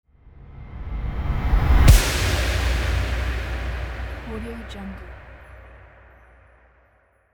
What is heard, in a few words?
massive hit fx